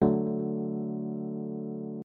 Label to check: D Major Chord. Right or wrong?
Right